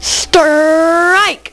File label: Strike; Fastball Fractions (Demo)